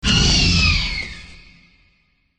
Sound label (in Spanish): grito